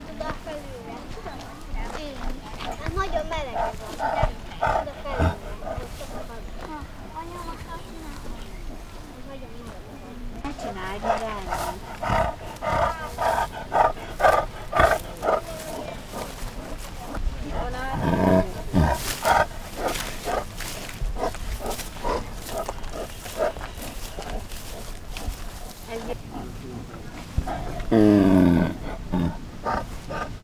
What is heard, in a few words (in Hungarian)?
négy rövid részlet, bengáli tigris; miskolci zoo 2009